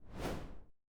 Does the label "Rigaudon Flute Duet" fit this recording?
No